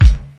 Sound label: dry bass drum sample g sharp key